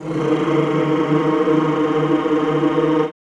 CHO Rrrr

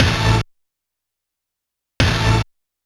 8bit Hit; Sonic Foundry (Sony Creative Software); Dr. Fink's Funk Factory; Orchestral Hits

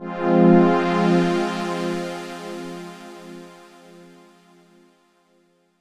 F minor, Chords; Electronisounds

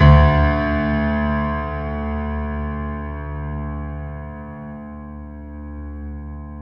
PED FF D, GRAND PED; Best Service Advanced Media Trax Modern Composer

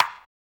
CYBER, Drums; Drums In Your FACE!; CYBER PRC

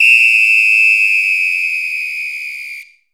whistle hi; AKAI S6000 CD-ROM - Ultimate

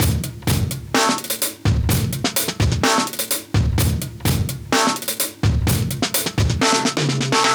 Live Breaks, 127

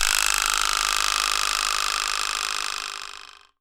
vibraslap

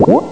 bubble world; Amiga Soundtracker Sample Packs

